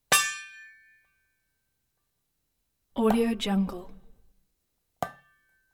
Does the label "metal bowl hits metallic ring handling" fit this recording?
Yes